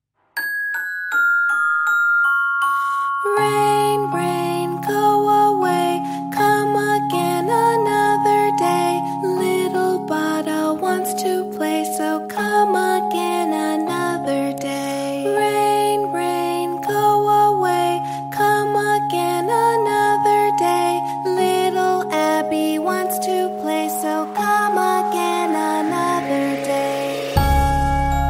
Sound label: Rain Rain Go Away; English